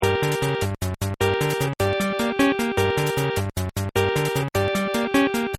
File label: song loop; Wreck-It Ralph